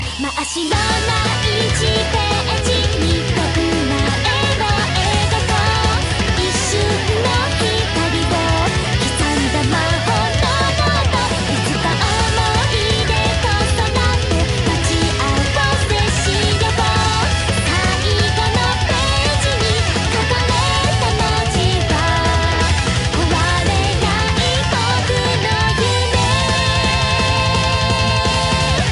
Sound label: metal